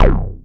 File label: OB C2 -L, Rare Synthesizer; Hollywood Edge - Giorgio Moroder Rare Synthesizer Collection; OB 4 VOX.